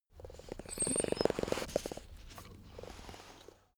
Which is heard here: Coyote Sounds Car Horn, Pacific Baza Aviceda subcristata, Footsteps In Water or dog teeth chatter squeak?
dog teeth chatter squeak